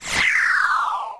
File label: Bomber Run; Sid Meier's Civilization 4 Complete; Beyond the Sword; Final Frontier; Units